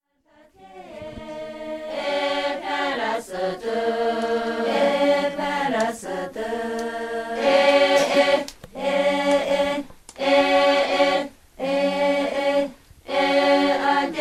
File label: song